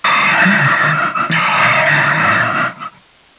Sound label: zombie idle; svencoop